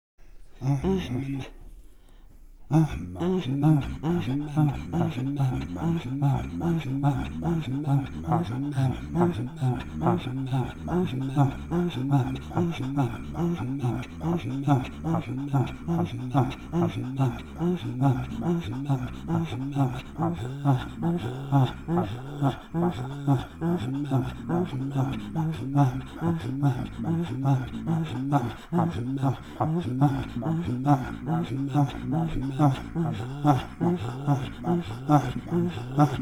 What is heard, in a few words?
Throat Singing, INUIT; Ahammama